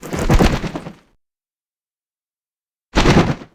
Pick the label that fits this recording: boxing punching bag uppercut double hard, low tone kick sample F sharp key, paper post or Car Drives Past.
paper post